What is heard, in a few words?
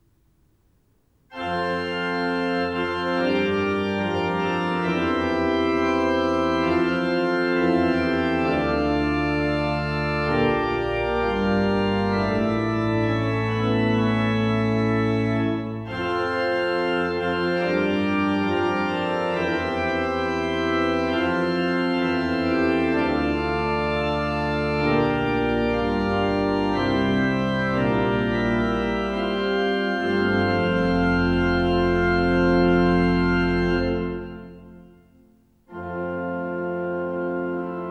Fanfare; Kopervik